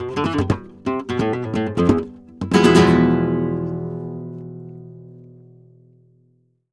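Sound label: Flamenco Guitar phrase e; Best Service ProSamples vol.46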